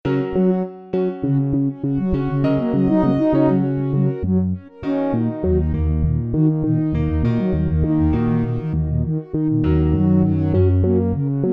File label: piano 100bpm